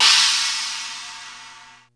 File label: RY30 SPLASH; Hollywood Edge; The Big Bad Beatmachine